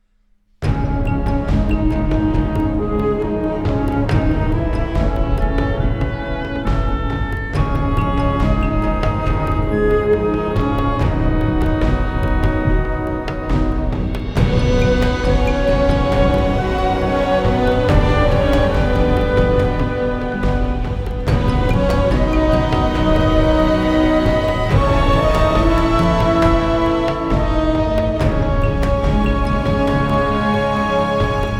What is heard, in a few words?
Ethnic Orch Sample; Battleforge